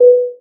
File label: airplane